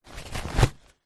hospital paper towel pull from wall dispenser single